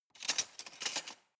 cutlery large drawer rattle

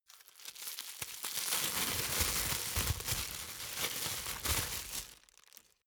plastic bag unfold

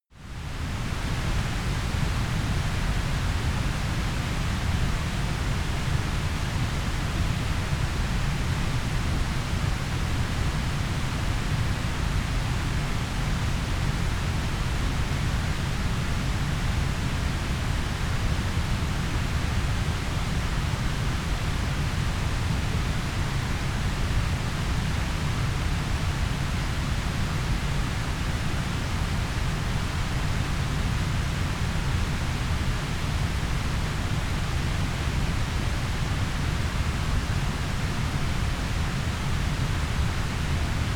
stream medium distant

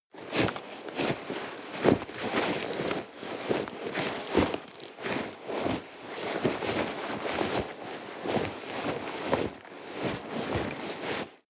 nylon leather hard very long

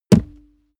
step barefoot metal jump

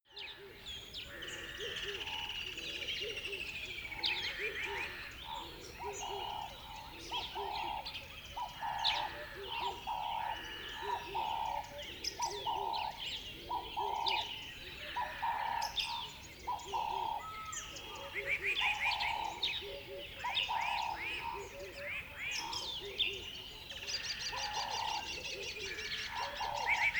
river bed dawn birds call quack

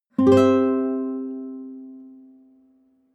nylon guitar chord high major

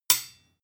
sword hit clack soft

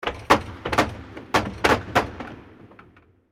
door opens

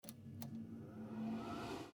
exhauster

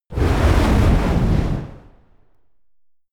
flamethrower short single

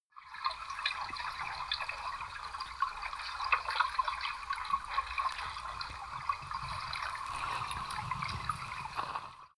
canal

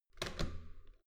metal door heavy handle down

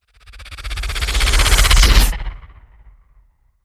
whoosh flutter

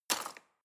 knife wood drawer place hard

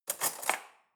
cutlery fork drawer handle grab

